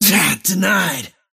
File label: deny pain; dota; kez